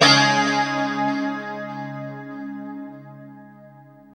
2CHD C#4, Guitars; Spectrasonics; Hans Zimmer Guitars VOL-1; JC-SHEEN

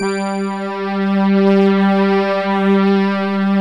FM SYNTH; OMI - Universe of Sounds

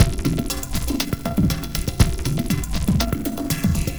LIGHTWAVE GROOVE

